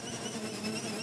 hedgehog spin loop; battle

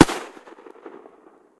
pop; World Of Goo; Fuse

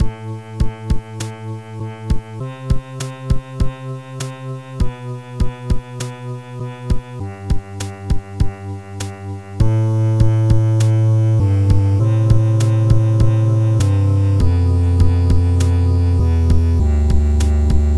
menu, music

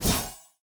close combat cold sweep; CrossCode; battle

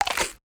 bite; CrossCode; battle

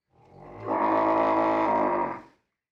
sloth moan; battle

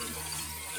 float short; lea

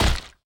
hit organic deep; CrossCode; battle

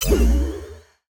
element ball charge

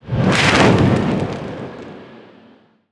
spear charge; boss; gynthar

